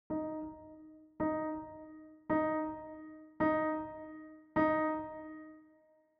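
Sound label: Bluethner Concert Eb4 8th -12dB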